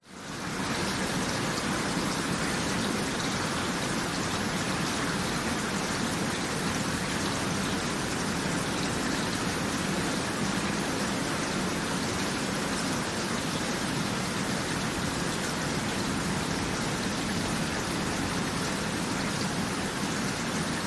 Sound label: nature rain light drizzle drips rain in house gutters